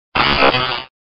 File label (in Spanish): Grito